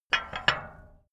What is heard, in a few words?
metal gate bolt